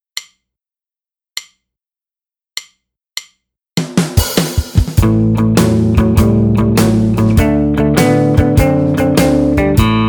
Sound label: Build Up